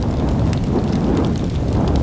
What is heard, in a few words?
Flames Phase; Rabbids Big Bang; Android iOS Windows gamerip 2013